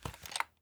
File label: movement rifle; gmod; arccw uc commons attachments